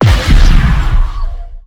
tachyon lance fire; weapons; Stellaris

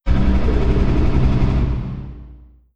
distance auto cannon fire large; weapons; Stellaris

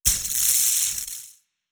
lightning hit electrical sparks, weapons; kaiju; Stellaris; biogenesis

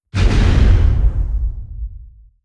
distance dragon wing attack; Stellaris; guardians

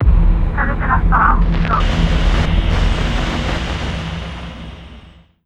comms cutoff; Stellaris; nemesis

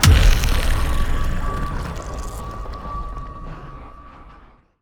lithoid spider; lithoid portrait; Stellaris

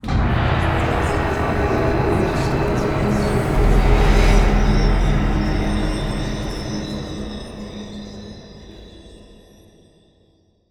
event death cult; Stellaris; necroids

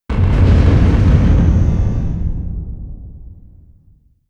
distance planet killer hit; apocalypse

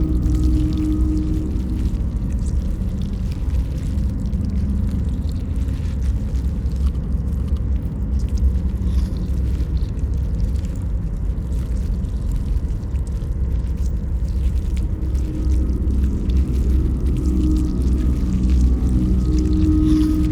ant ship starbase idle; biogenesis

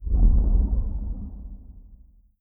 dimensional horror portal thunder; Stellaris; guardians

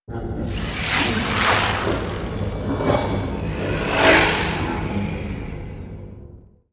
event cityscape; Stellaris

